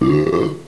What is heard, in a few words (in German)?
ruelps